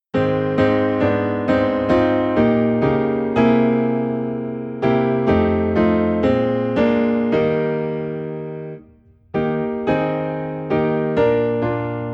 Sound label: Piano in E Flat; Living for Jesus